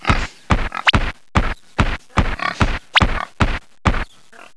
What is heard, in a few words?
Bowser Sound; PC; MARIOTEACHESTYPING2